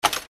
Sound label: reelspin click; Flaming 7s